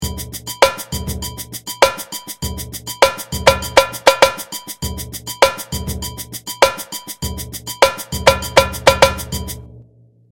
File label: TAMBOURIM; Karla Shnikov